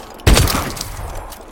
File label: Headshot